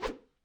Imp Attack Whoosh, Melee Whooshes; Foley

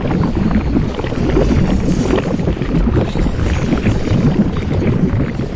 lava loop; Quake 4 Alpha; id software

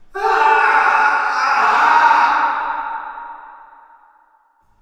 prison pain scream; Quake 4 Alpha; id software

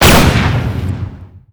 tank nailgun fire; Quake 4 Alpha; strogg tank

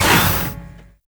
retch servo, strogg; Quake 4 Alpha; id software

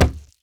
bodyparts fall; Doom

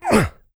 pain light, player; Doom

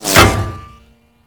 metal imp, ambience; Quake 4 Alpha; id software

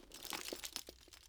Commando Belt Rattle; Foley; Commando walking elements

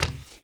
drag step; Doom

